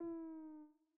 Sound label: Piano Portamento Notes; Piano Nudes